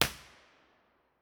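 tunnel entrance b 4 way mono, impulse response, Innocent Railway tunnel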